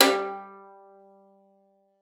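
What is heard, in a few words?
violin pizzicato G5; Xsample VOL-5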